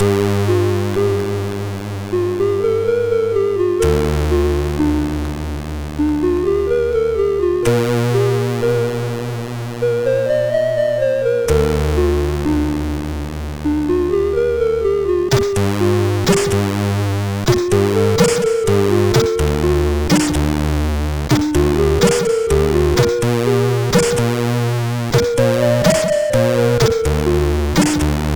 Trebbler, sid CSG8580R5

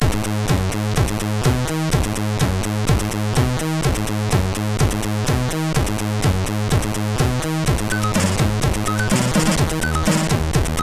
tune, MOS6581R3; sid; Lascivious tune 3

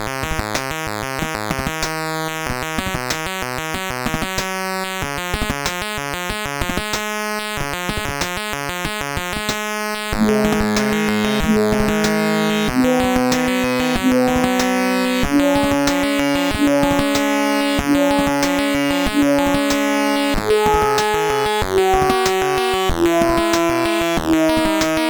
sid CSG8580R5, GAMES; Colony

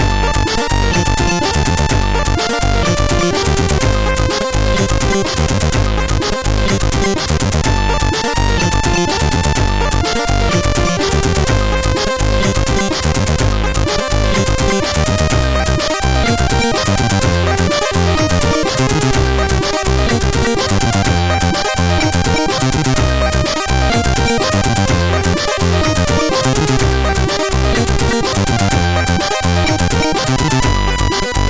Sounds, sid CSG8580R5

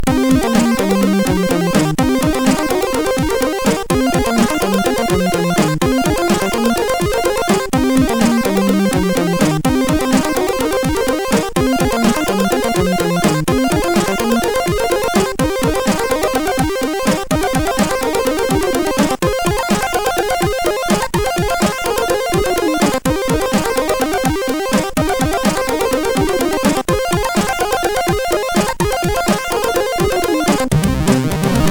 Chubby, MOS6581R4

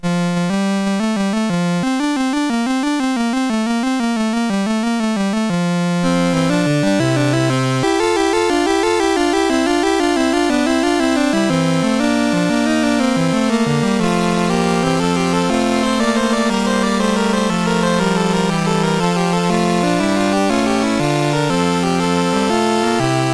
Fugue MOS6581R2; Magnificat Fugue

